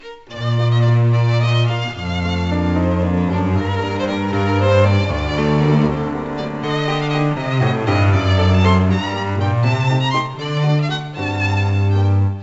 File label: Music Outro; Lernerfolg Grundschule Musik Little Amadeus